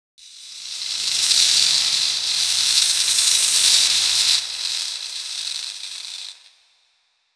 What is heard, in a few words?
a sharp wet hiss from, spider